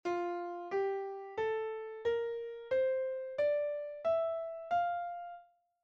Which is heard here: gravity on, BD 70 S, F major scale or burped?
F major scale